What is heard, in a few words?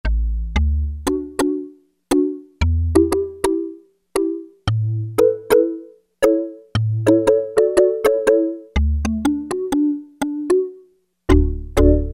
Wood Mallet, Roland MKS-70